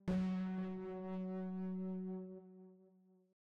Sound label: Mushrooms Bloom